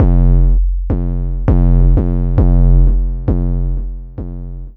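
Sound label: MINX bass drum, drum loop; Big Fish Audio; Uncivilised G'rooves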